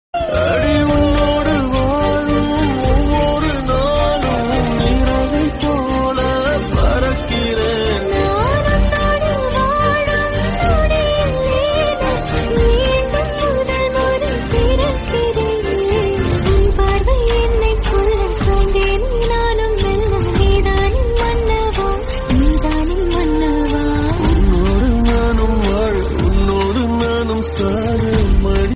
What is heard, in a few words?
female